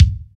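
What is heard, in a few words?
bass drum, Roland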